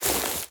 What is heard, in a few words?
Footstep Grass